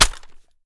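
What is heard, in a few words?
submachine gun reload empty hit mag; gmod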